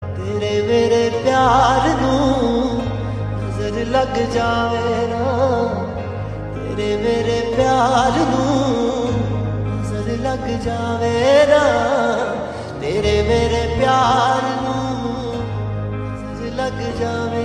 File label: Ringtone